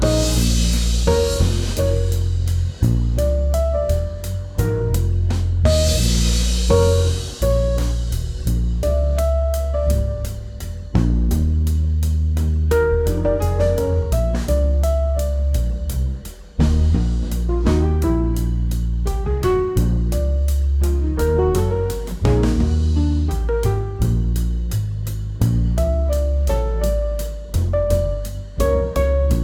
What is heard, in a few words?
A# Major jazz